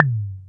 reel stop; Back to 70s; wazdan

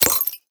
win; DraculasCastleWD; wazdan

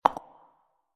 chip cast, chips; Caribbean Beach Poker; wazdan

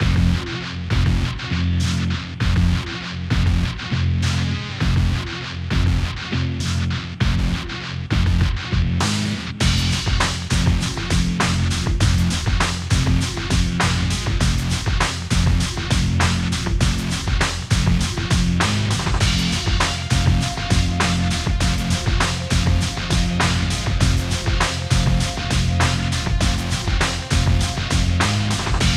ambient music; Burning Reels; wazdan

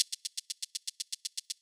reels rotate; DragonsLucky8WD; wazdan

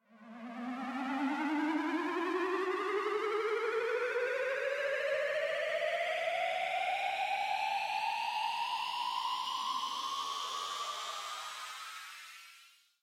increment; Colin The Cat; wazdan; bigwin

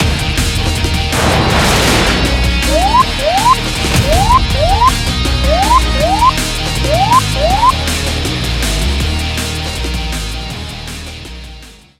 super win; Burning Reels; wazdan

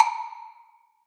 coin value; Butterfly Lovers; wazdan

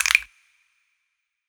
stop frame show; Beauty Fruity; wazdan

